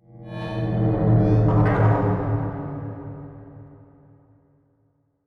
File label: cave, ambient; minecraft; SMCNV-pack